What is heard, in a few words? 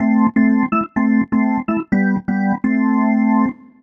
DEEPHOUSE ORGAN 125 A